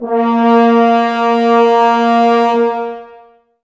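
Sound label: Brass French Horns A#; Sample CDs; Miroslav Vitous; Woodwind Brass Ensemble; F.Horns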